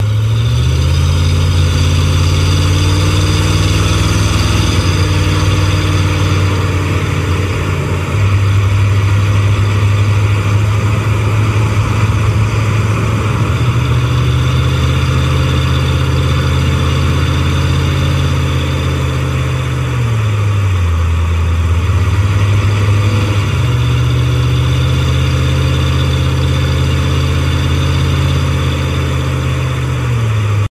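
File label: vehicle start loop taxi; The Sims 3; windows gamerip 2009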